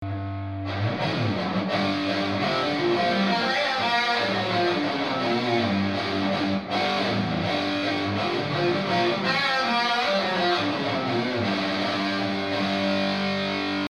guitar rig sample

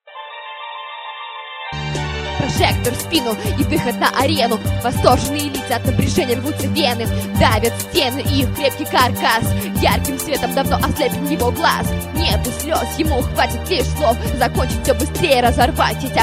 scrat

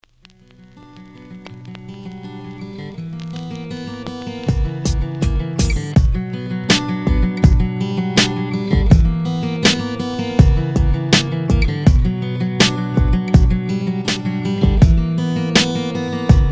guit

